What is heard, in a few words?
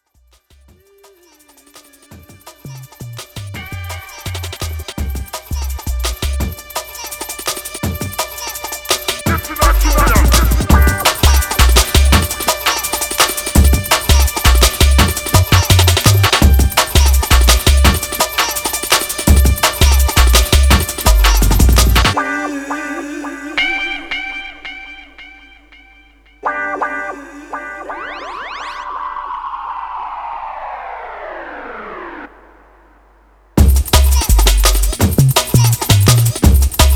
Jungle Funk